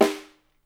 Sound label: SN, Percussion